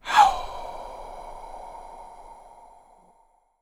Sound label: ATTACK HOW, VOCAL WIND, Jazz FX; Spectrasonics; Vocal Planet